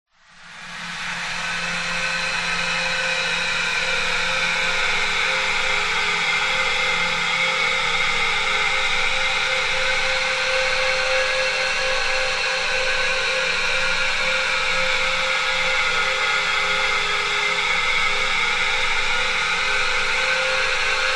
science fiction atmosphere airy hiss whining desolate